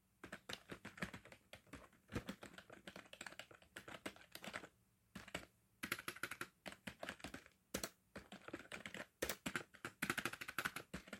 pechatanie stiranie na klaviature

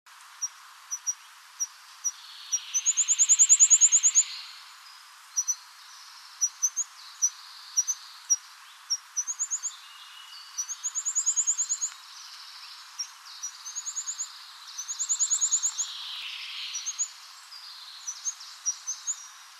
lesnoj ptichij effekt

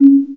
knopka klik gluboki nezhnii zvonkii

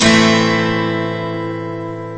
gitara akkord lya minor am rezkij

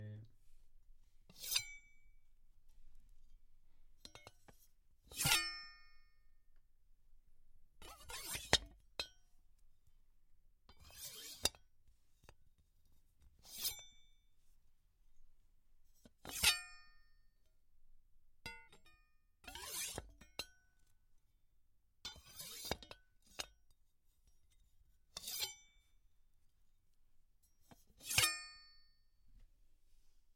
nozhevoj boj

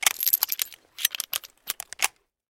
zvon vipavshih patronov iz barabana revolvera

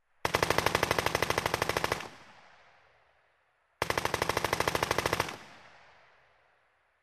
pulemyot i dva vzriva

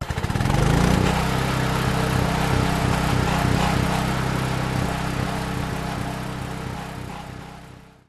generator vklyuchaetsya i rabotaet

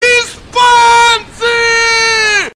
ispanci dikij krik

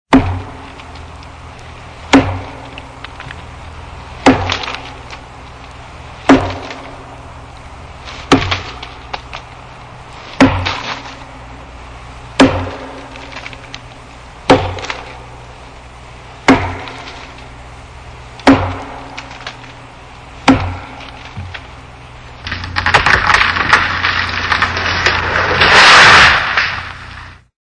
rubka dereva padenie dereva

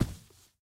korotkij chyotkij rezkij odinarnij vistrel salyuta

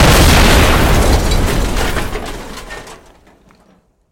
rezkij oglushayushij grohot

